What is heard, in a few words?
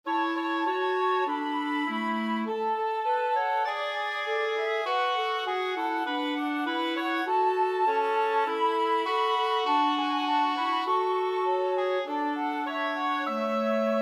contrapunctal trial a major; polyphonic; For this project I was asked to write three polyphonic pieces, which should each be for th